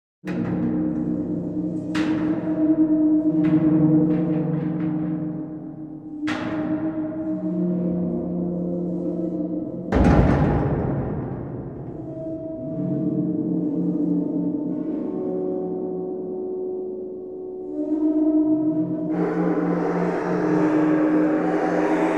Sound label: Creepy Stuff After Phone